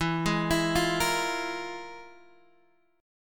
F Minor Major 7th Double Flat 5th

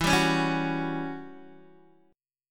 E Minor 6th Add 9th, Guitar, DADGAD

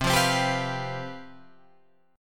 C Major 7th Suspended 4th C down Guitar Open F; CM7sus4 strummed

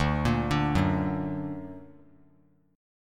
D Augmented D down; D+ arpeggiated; JGuitar

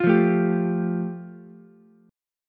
F Major Add 9th, Ukulele C6; Fadd9 strummed; JGuitar

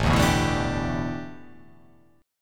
A 9th Suspended 4th, A9sus4 chord; strummed; JGuitar

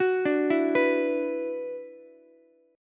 Gb 7th Suspended 4th Sharp 5th Gb down Ukulele; arpeggiated; JGuitar

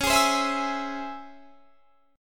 C sharp Minor Major 7th C sharp, chord; C#mM7; strummed; 21,23,22,21,21,24

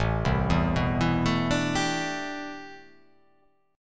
A Minor 11th A, Chord

